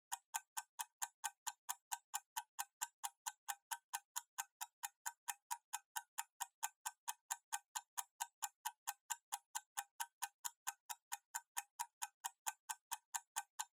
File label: Kitchen Timer Tick Long